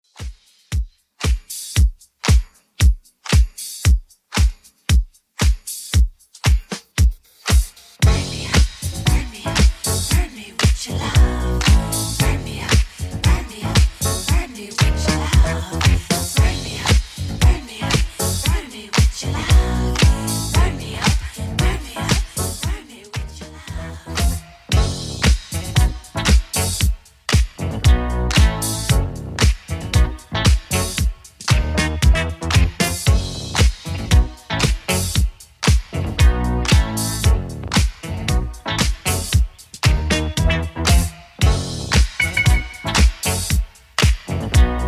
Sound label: 70s Redrum 115bpm; remixplanet